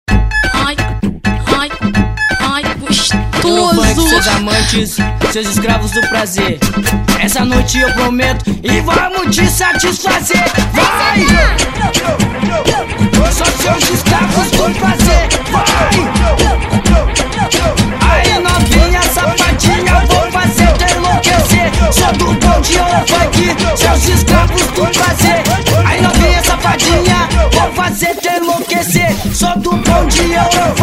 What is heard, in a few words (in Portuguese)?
eurofunk